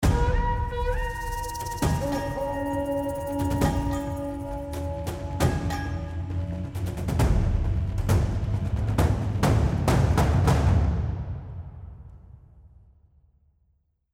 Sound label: Bandit Alarm; horizon zero dawn